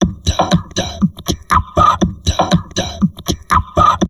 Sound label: TALK, Drum Loops Crazy Processed